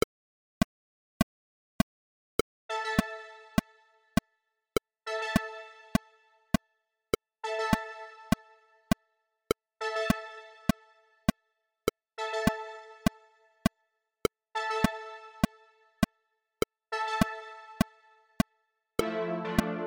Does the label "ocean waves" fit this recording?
No